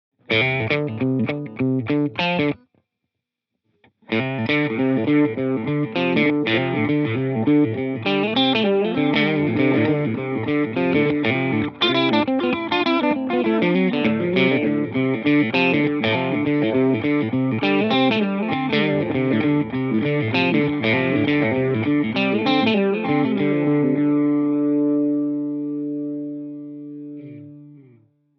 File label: Tape Old Tape